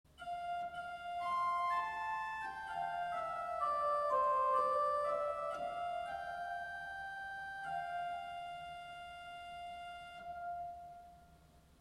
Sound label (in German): HW Octave 4